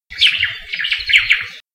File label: omao call